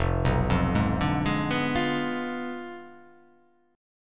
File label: F Diminished 7th F down